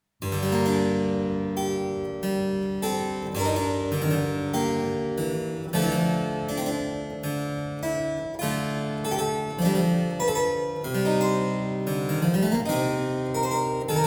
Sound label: Praeludium, harpsichord; Byrd; Praeludium to the Fancie, BK12 [fifth version]